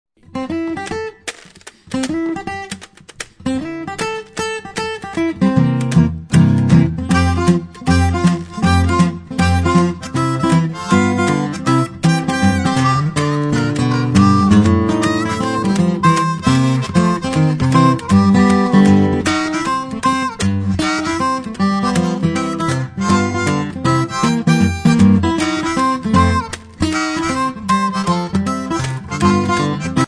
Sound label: Shuffle Rag